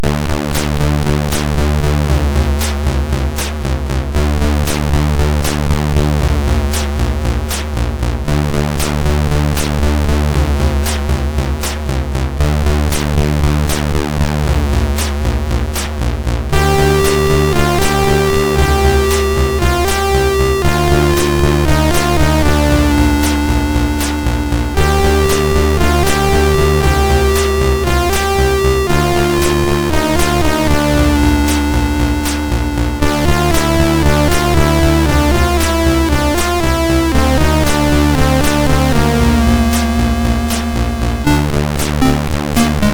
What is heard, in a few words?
Weird Song; Zeus TDC